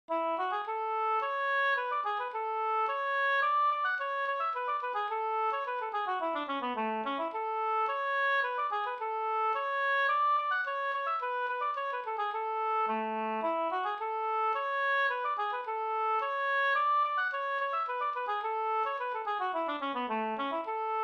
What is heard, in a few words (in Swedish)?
slängpolska, A-dur